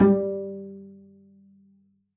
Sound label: pizz g3, double bass